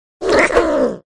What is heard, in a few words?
cat attack voice